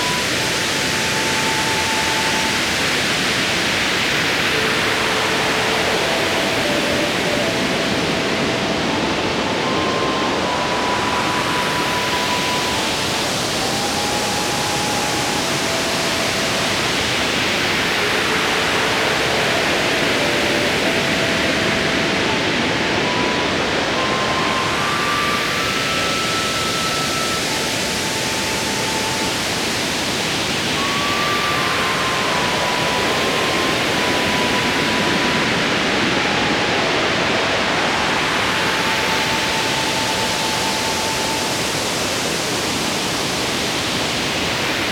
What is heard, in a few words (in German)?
Meditation